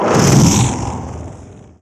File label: fireblast cast; weapons; dota; ogre magi